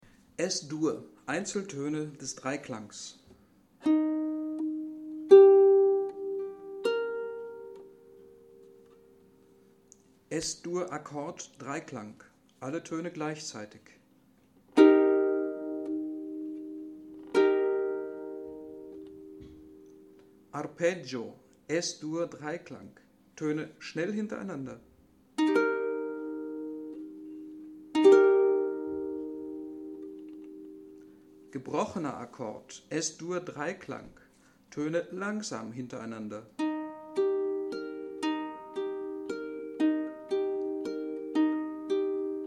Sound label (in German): Akkorde